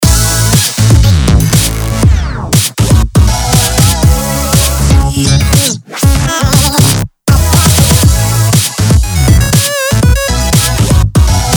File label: Modern Beat Jingle